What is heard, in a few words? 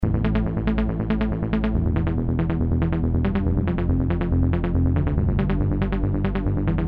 jump bass